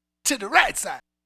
Funky Mama Vocal, 70s Analog Funk; The Funky Mama; Sonic Foundry (Sony Creative Software); What it Is! 70s Analog Funk

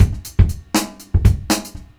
Breakbeats loop, 70_s Breakbeats; USB Soundscan vol.46; AKAI

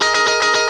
JANGLE GTR; E-MU Formula 4000 Series Vol. 1; Hip Hop Nation; Funky GtrLicks

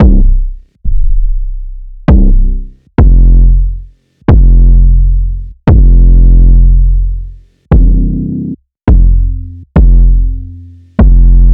Kick Processed

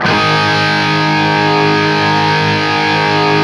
5TH G#2, guitar; 5THS LOOPED; East West; Steve Stevens Guitar Samples Collection

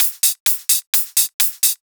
Hihat Loop 128 BPM

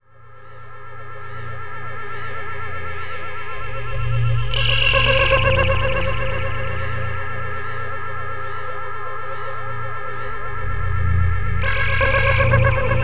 Odd Atmosphere; Sonic Foundry (Sony Creative Software); Slow Silhouette

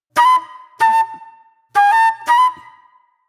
Mellow Flute

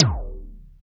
RESTEK F; Drums In Your FACE!

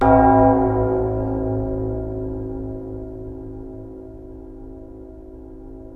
space bass A; InVision Interactive; Lightware VOL-1; Instruments & Percussions; DEMBY

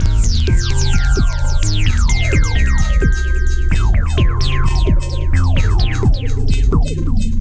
Combination Rhythm

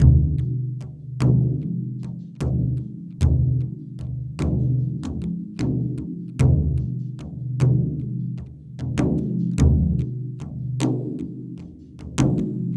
Frame Drum Harmo, Ethnic Percussion; Sonic Foundry (Sony Creative Software); Ambient Atmospheres & Rhythms

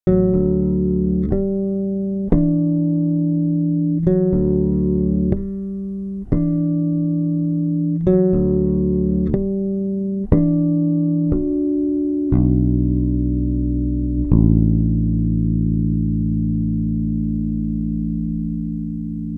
Processed Electric Bass

